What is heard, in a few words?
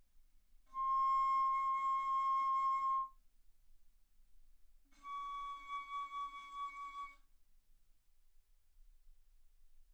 Bass Flute ff C6 Db6